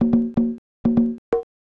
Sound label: HOUSE PERCUSSION 125; Beats